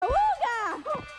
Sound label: awooga